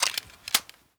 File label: ak74u insert magazine; Rust